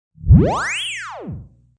Long Zap

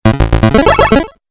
8 Bit Style Crazy Melody; Video Game

